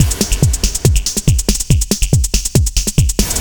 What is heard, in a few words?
Dance Drumloop; Drums; Dance Beats